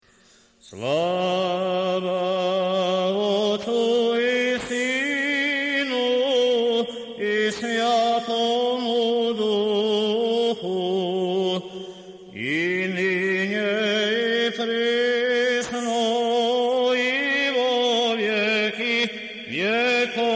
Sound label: Osmoglasnik II glas